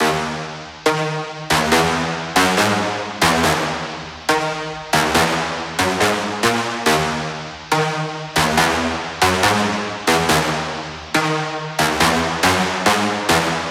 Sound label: Brass Loop 140BPM D#min; Brasses; Instrument Loops; 99Sounds; Monosounds